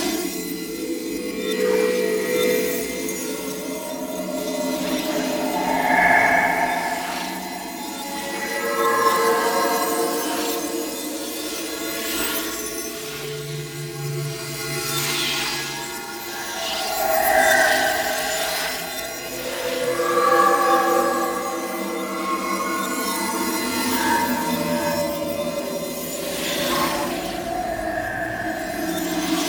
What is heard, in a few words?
Nokko Visions Bed Amb Loop; Warframe; Nokko Colony